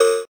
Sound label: Boop